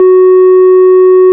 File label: ORG, Farfisa